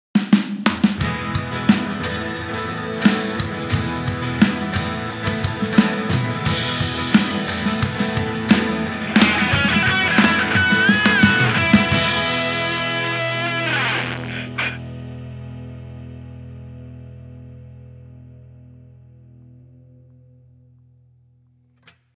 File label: rock